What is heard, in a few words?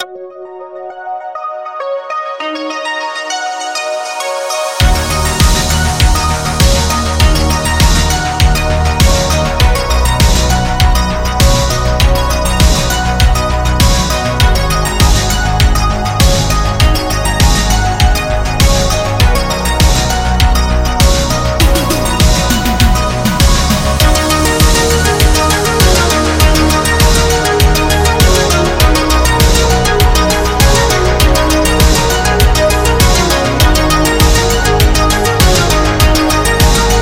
Retrowave; synthwave